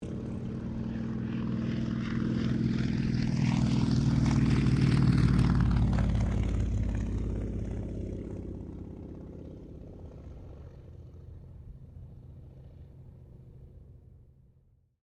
AIRCRAFT T-28D TROJAN PASS

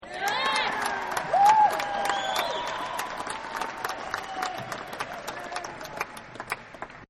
Yes And Claps; Applause; Sound Effects:Human; Partners In Rhyme

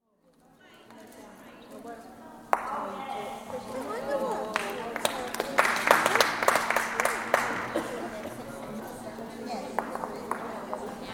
Ladies' Bowls, indoor meeting with speech; BBC

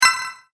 multimedia rollover, Multimedia Rollover Tone

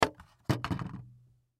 Alarm Clock Set Down Wood Desk; Alarms; Electronics; Household

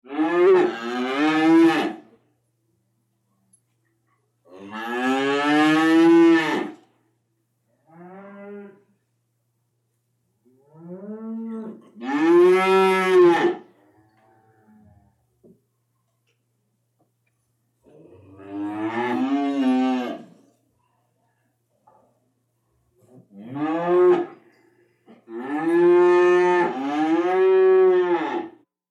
Cow Two Interior Moos; Cows; Animals

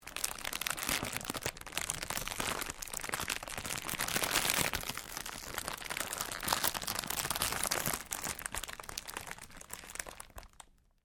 Cellophane crinkle

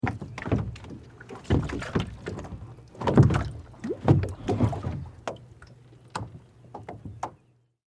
Vehicle Kayak Step Into Boat; Boats; Vehicles; SFX Bible

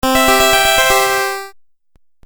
Game Music Phrase, Video Game Sound Effects

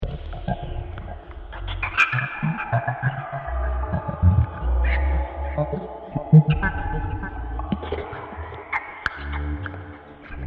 Toxic Space Toads, monster; science fiction sound effect; Andrew Fleming; Twistedtracks

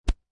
Golf Miss Hit; Sports

